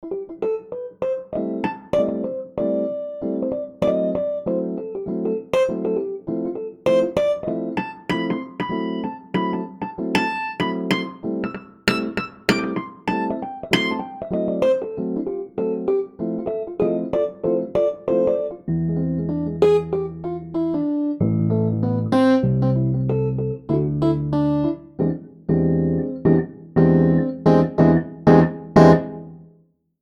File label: Electra Dyna; hohner; pianoteq8